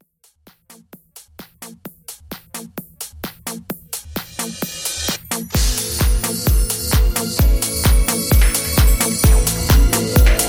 House Christmas 130 BPM Clean

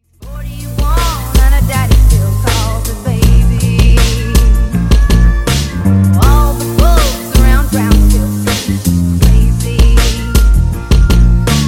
Funk Redrum Edit 80 Bpm

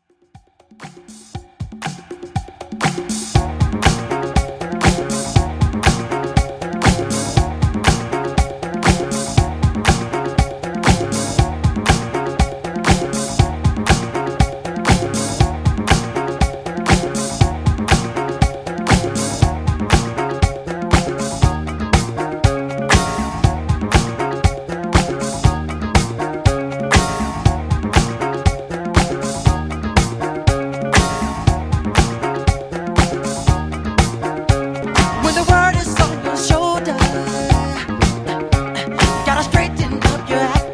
Dj Intro Outro 119Bpm

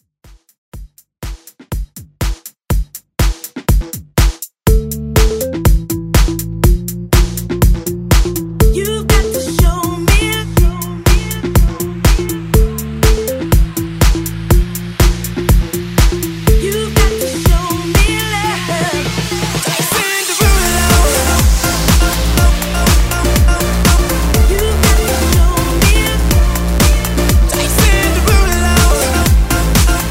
90s Dance Redrum

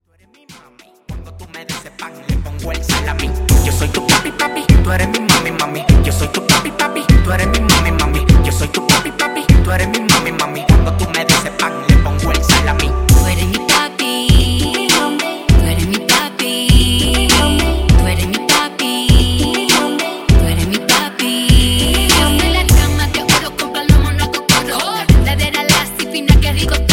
Latino Mashup 100 Bpm

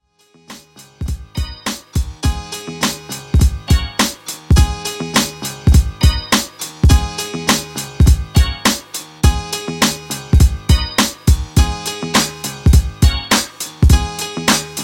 Old School Redrum 103Bpm

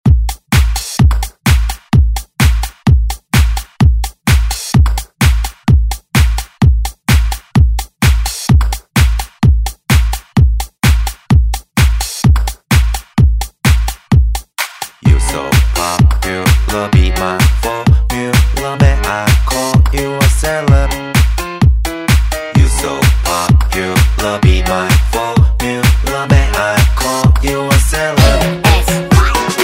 Kpop Dance

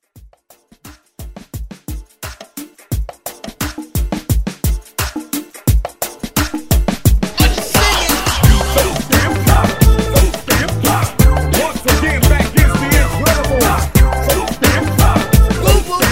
90s Reggae Hype Redrum

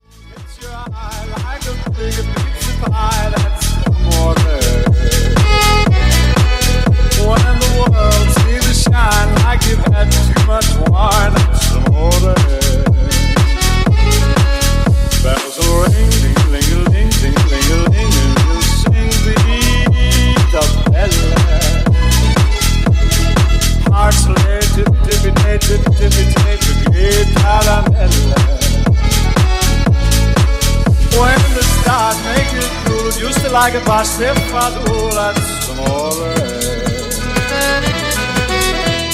50s Rebuild; Remixfreakz

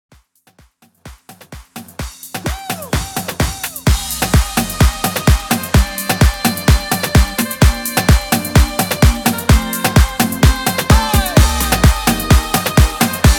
LATIN REDRUM 128 BPM

